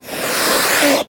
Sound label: Ocelot Hiss Medium